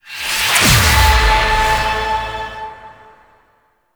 Choir Chorus Cast Damage

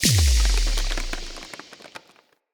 Thorn Bow Stone Arrow Impact Enemy Full Charge; Weapon Impacts; Envoy Fey Bow

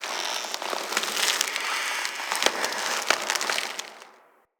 Root Cave Root Creak; Ambience; Neathuns Root Cave

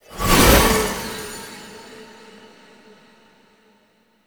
Horse Appear; Vehicles; Foley Actions; Warframe; Duviri

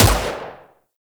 Rifle Shot; Weapons; Warframe; Corpus; Ruban

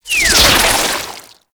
Ink Rifle Alt Whizz By; Warframe; Tenno

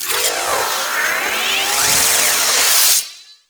Finisher Parazon Equip Long; Kuva Lich; Warframe

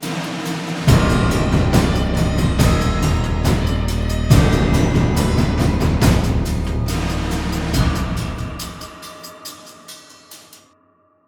Music; Scene9 5 5 Choice; Warframe; Cinematics; War Within

